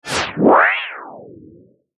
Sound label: Whoosh Warble Scratch; Blastwave